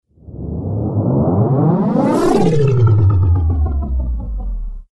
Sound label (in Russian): vertushka effekt